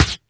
Claps & Snares

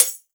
Open Hihat

